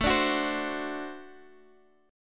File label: D 7th, A